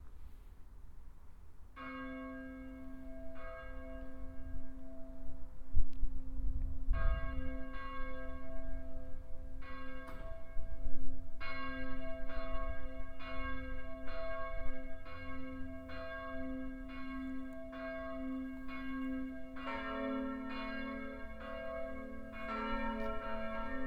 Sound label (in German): alle glocken